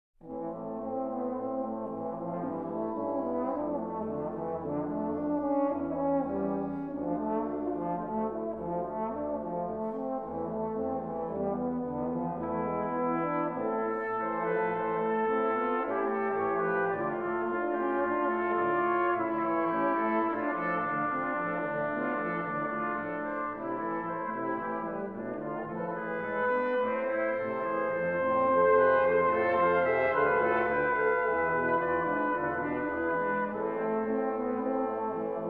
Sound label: Choralvorspiele, cuivres; elf choralvorspiele no10